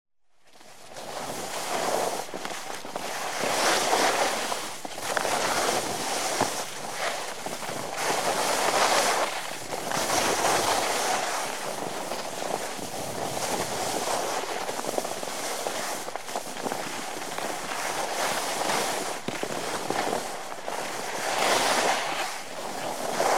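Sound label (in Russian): лыжник на беговых лыжах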